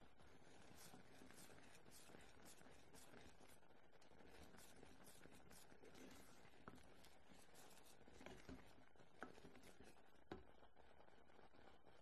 glitched tones household kitchen cooking porridge boil pan stir